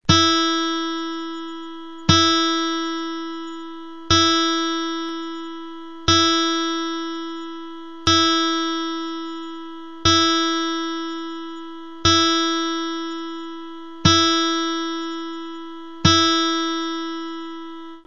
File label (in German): Stimmen E Stahlsaite hoch